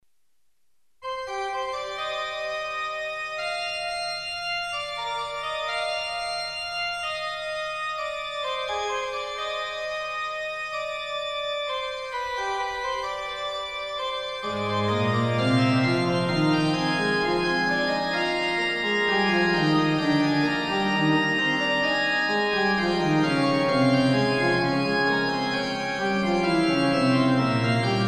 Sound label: Fugue C minor